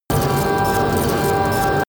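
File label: screech treadmill, science fiction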